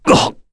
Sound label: Vox Damage, kr; Bernheim; kingsraid